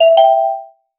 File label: a very short notification, rising volume